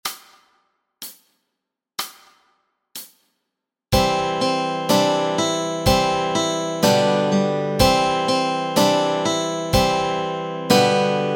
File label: Yankee Doodle melody and chords